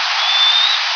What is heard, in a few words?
rat